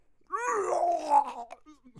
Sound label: male death